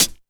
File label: HH, Dance Mega Drums; Best Service; HH-HIP